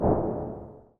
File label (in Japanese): 足音; LcdDem SFX